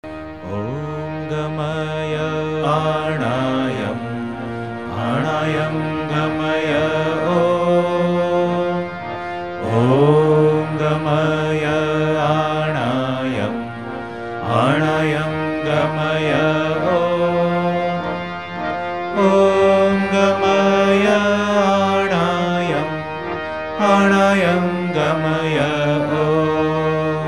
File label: Om Gamay Aanaayam; paryushan bhakti 2016; devotion collection